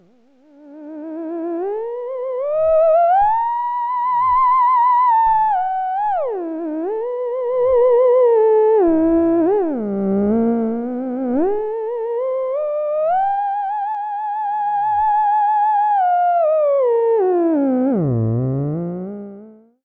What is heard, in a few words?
theremin